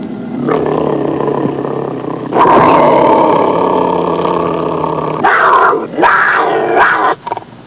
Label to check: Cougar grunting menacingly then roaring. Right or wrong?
Right